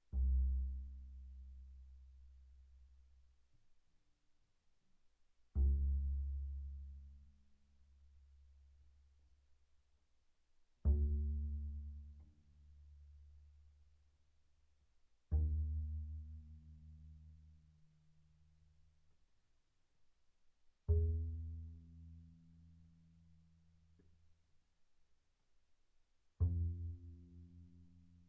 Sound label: Bass pizz sul A pp C2 B2, double bass